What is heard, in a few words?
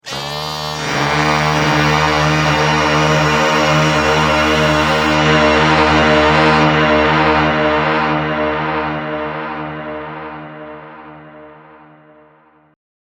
kazoo chant pitch shifter; kazooka